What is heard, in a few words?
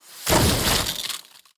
Ice Stome Area